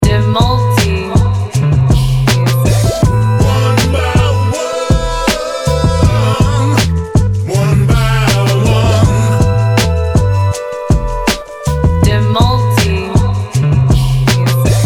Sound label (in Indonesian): edm, menggabungkan soul dari vocal blues dengan energi drum dan aransemen modern.